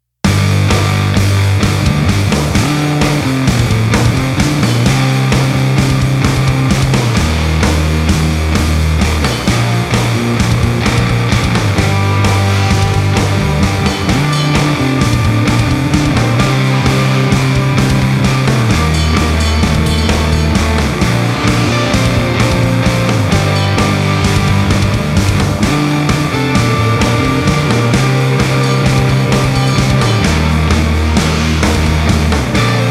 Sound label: Galloping 5ths